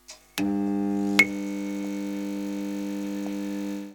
Light Bulb Switch On

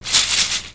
Lego Shake 2 Times

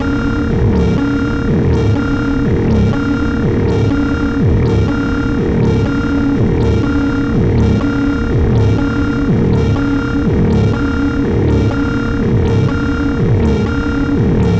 Synth Alarm

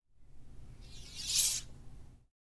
Lasers

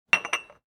Cup And Saucer

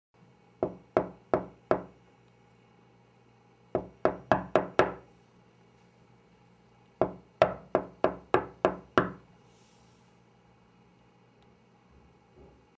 Knock

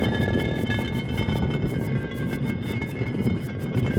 Friction Stone

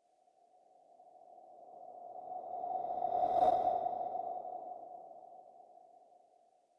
Close encounter

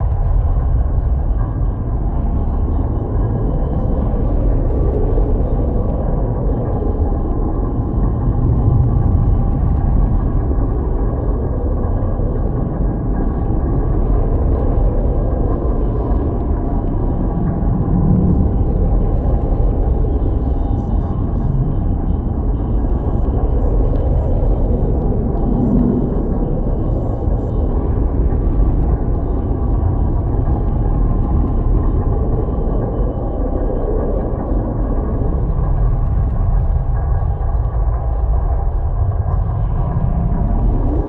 Orbiting Planet Gas Giant

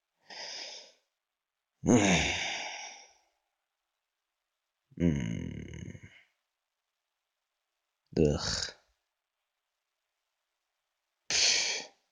Male Sighs Variations

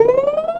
Nice Game Find

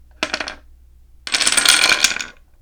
Domino

